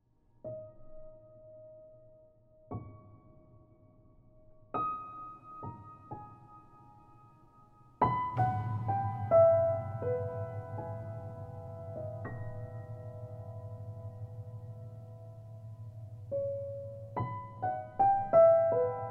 silent rain

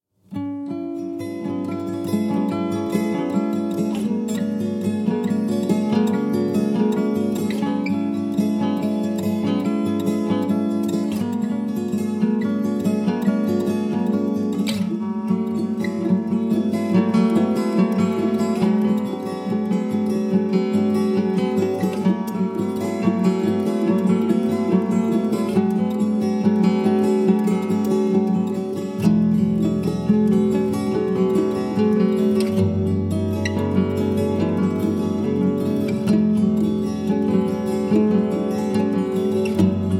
guitar

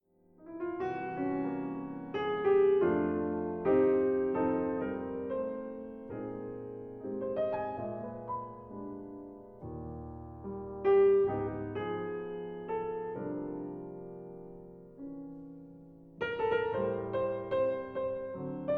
piano

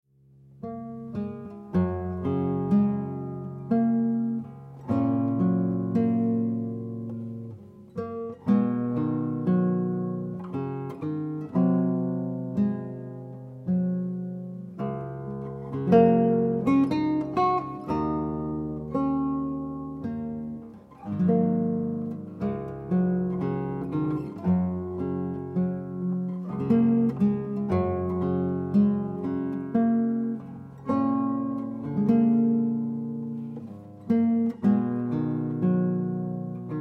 guitarscape

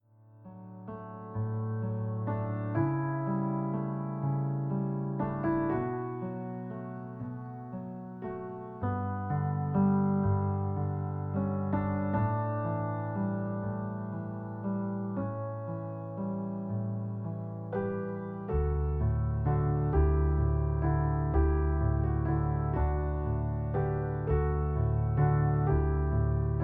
piano

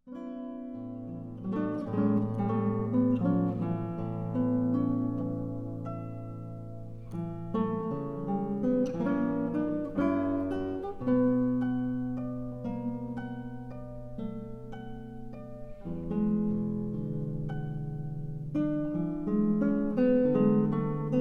my guitar story

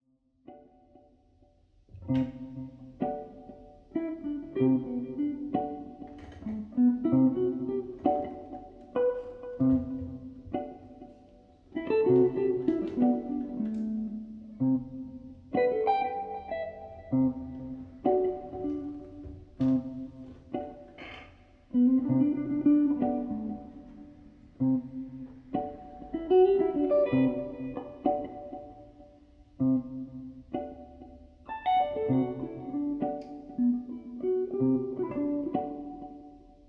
meditation

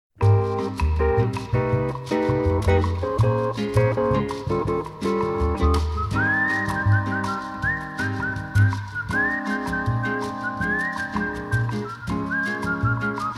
guitar

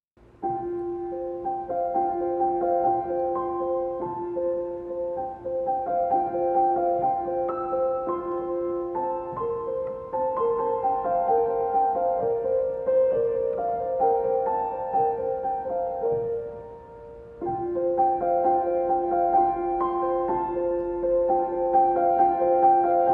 piano diary

